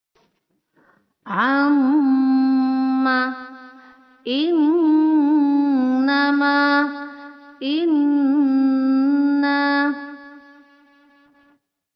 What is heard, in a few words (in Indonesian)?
Perekaman baru Karaoke denoised; dengung ghunnah; mim dan nun bertasydid